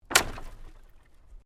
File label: Wood Planks Break; Material components for constructing more complex sounds; Wood (Sound Effects:Materials); SoundEffects-Foundation4